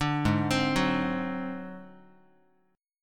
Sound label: Ab 7th Flat 5th Guitar, chord; arpeggiated